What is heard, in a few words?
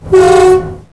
klaxon, Train; OpenBVE